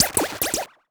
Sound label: Multimedia Internet CD-Rom Flash Click Electronic